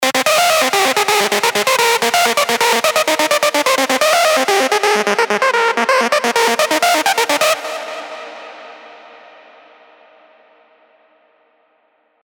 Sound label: LD, Sylenth1; Freakin' Nasty; alonso 18 year anniversary pack